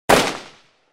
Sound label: gun